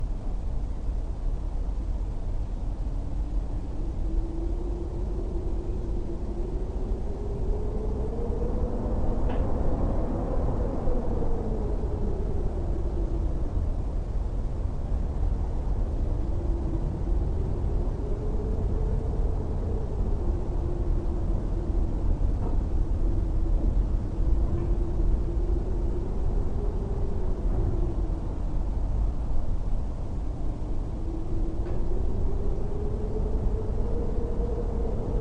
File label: Night Ambience; Blue Oddities